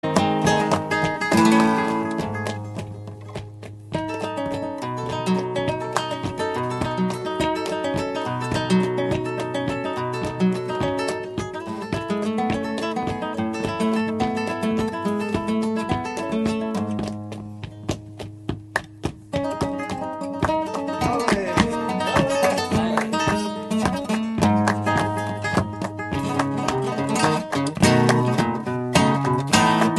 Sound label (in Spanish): guitarra; flamenco; Maestro Miguel de Badajoz; luthier Abraham Ortega; otono flamenco fuente de cantos